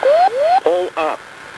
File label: pullup